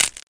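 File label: gls, impact debris glass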